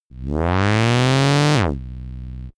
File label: synthetic beam loading; krieg-waffen; soundpool